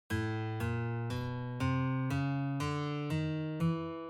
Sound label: Acoustic Steel String